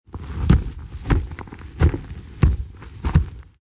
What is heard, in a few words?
Creature Heavy Footsteps, Large monster, animal, creature or heavy person; heavy footsteps on dirt ground; Monster Sound Effects